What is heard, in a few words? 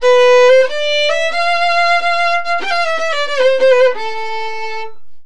VIOLIN, Balkanish Traditions